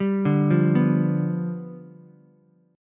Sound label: G 7th G down Ukulele; G7 arpeggiated; JGuitar